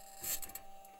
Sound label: mic, mars2020 edlcam